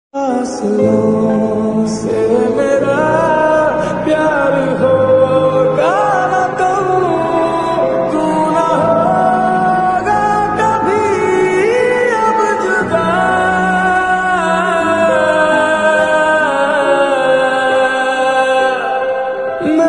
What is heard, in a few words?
new song ringtone